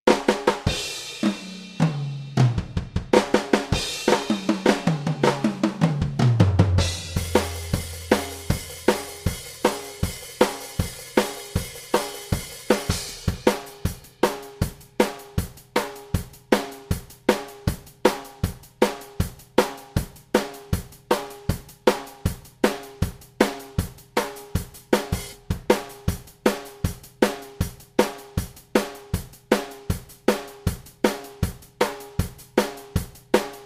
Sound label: rock drums